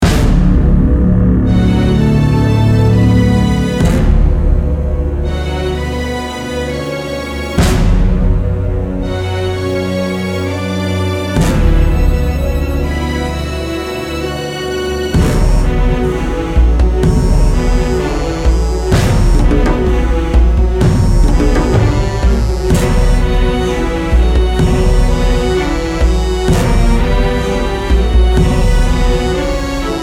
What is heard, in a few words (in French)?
Musique